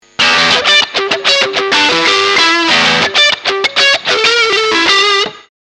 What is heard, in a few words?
Oil Dist High Position